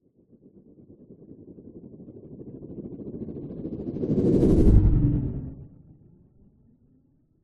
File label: science fiction spacecraft fly by fast